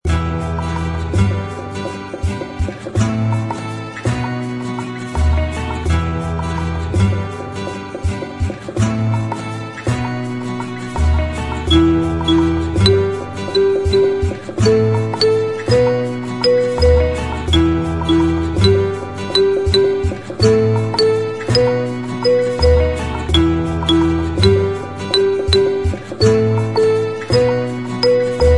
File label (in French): jaz boucle avec xylo